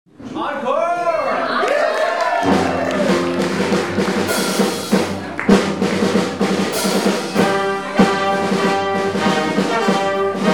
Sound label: Orchestra Out